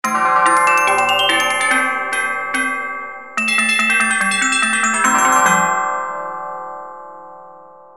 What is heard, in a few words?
gamelan